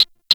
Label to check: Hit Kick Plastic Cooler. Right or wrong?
Wrong